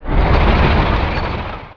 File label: missile tank move; Emperor - Battle for Dune